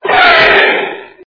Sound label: fishman death